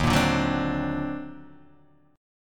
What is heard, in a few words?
D sharp Minor Major 13th D sharp, down, Guitar, Open D